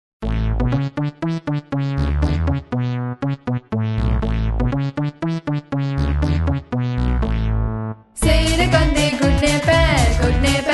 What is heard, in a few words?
Head Shoulders Knees Toes, Hindi Kids